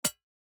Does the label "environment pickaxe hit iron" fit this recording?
Yes